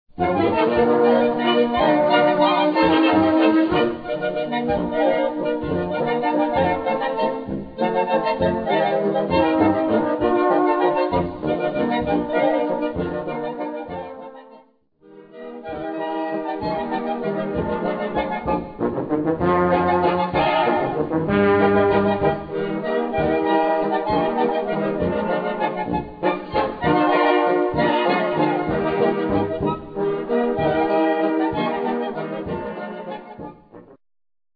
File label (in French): CLARINETTES; LES CLARINETTES DU LAUCH